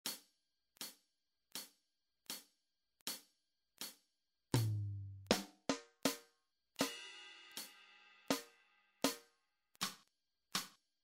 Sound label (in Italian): Esercizio ritmico